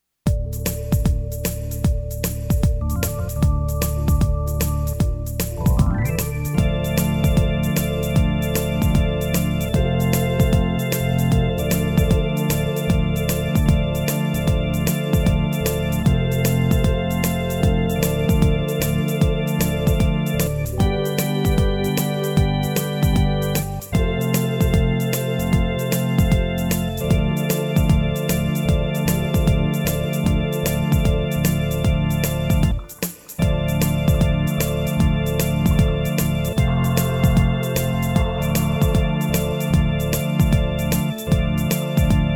Instrumental

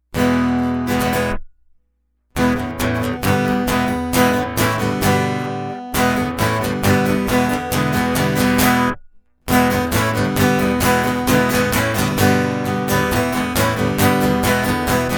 Guitar Loop; Impart On Christmas Day

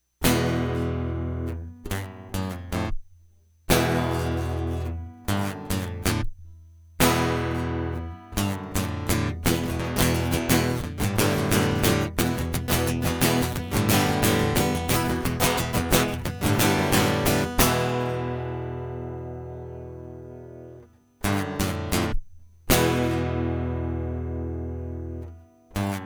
Guitar Loop

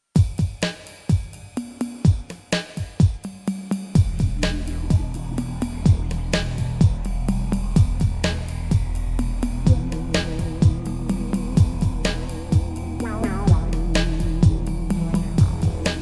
Instrumental

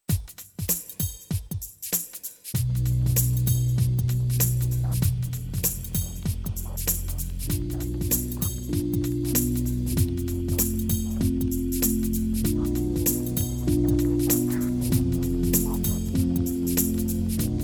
Instrumental

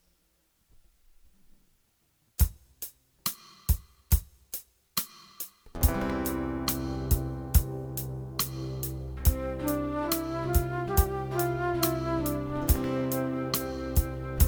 Instrumental

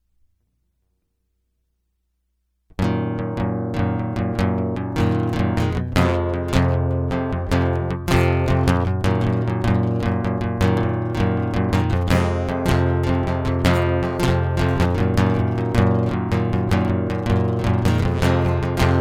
Guitar Loop; Smile On

